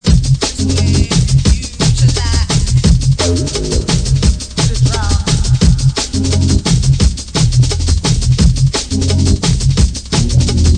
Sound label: Breaks